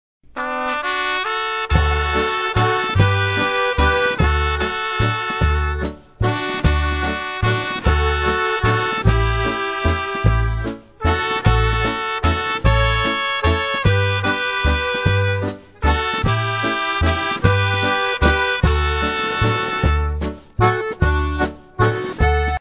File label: waltz